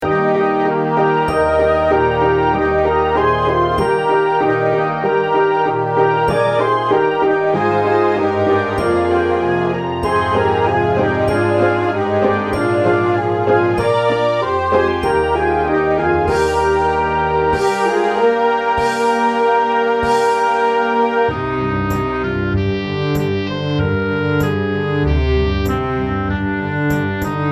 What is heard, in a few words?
processional